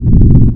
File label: Rumble; Playable Tutorial Level